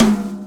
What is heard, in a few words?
tom; drums; virtual drum sounds